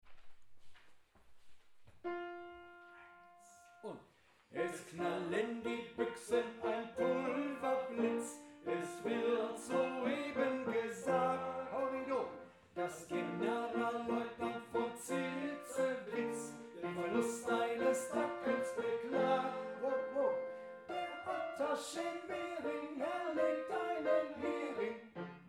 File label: tenor